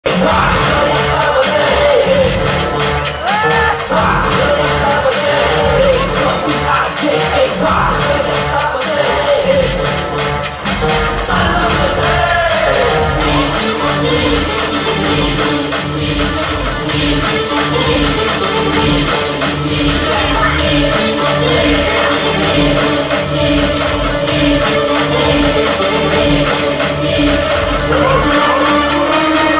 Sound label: interval bgm